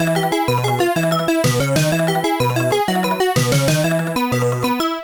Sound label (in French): jingle defaite; Beach Volley